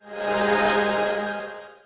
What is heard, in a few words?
star collect